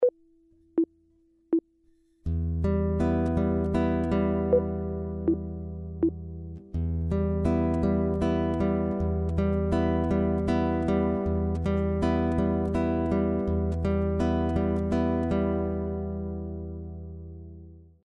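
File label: chord, guitar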